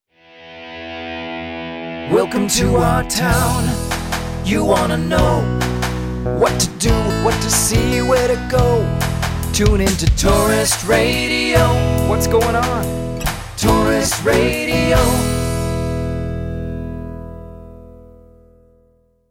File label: jingle; Tourist Radio!